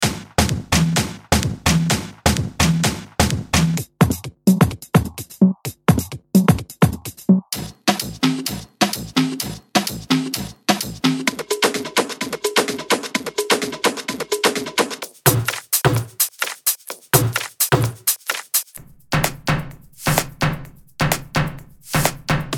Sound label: Tribal Elements; Audio Boutique